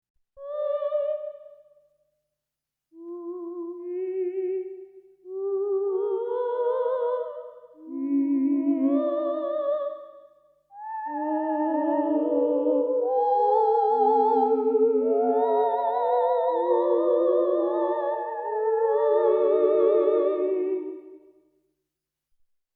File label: Chowning FM Females